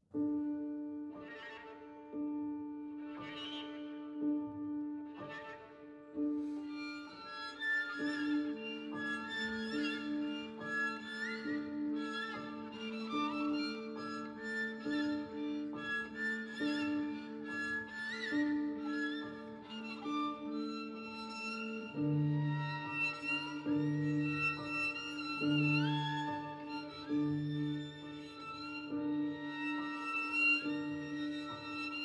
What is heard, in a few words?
Romanian Folk Dances; B. Bartok; Pe Loc